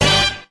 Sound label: kaboom, stabs